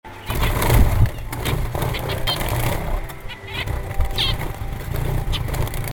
Flying Birds

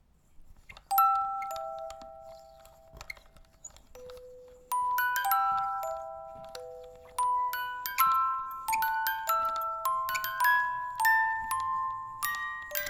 Music Box

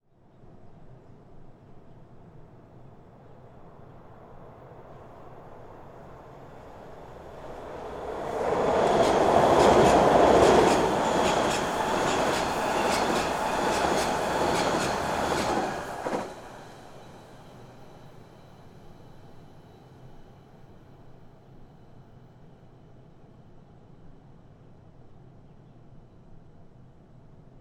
Passing Subway Train Maryland U S

